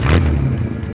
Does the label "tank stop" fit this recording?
Yes